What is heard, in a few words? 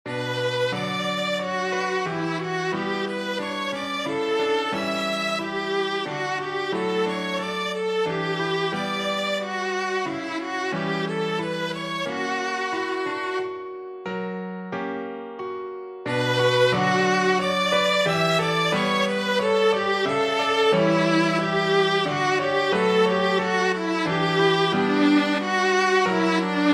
sarabande